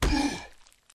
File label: zombie hit